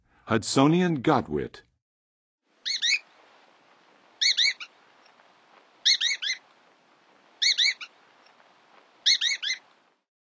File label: Hudsonian Godwit, Birds of North America; Western USA; Western USA Songbirds